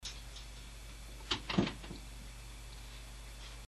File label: wall; wall-naguri